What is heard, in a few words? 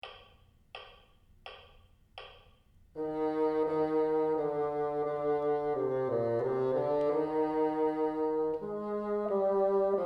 teacher, bassoon